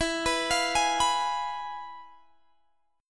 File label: F Minor Major 7th Flat 5th, E